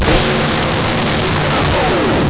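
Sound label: droid down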